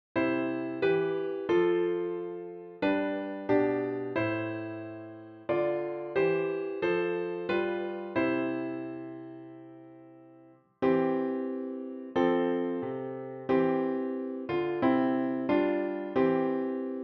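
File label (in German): O du fröhliche, Klavier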